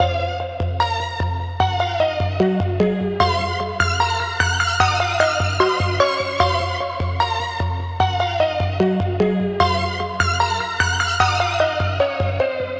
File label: Synth A#m 150, Trap Loops; GhostHack; HipHop; Eerie